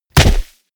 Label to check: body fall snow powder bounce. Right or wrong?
Right